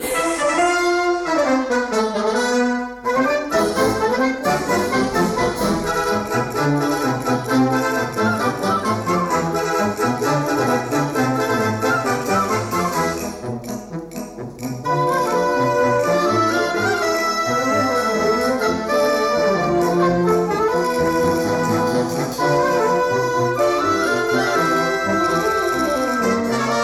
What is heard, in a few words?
Paso Doble; Torero Arte y Pasion en la Arena